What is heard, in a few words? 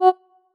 marimba oo choir